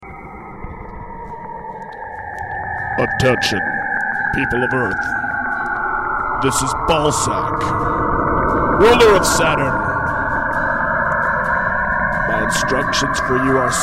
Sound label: Spooky Sounds of Saturn In Reverse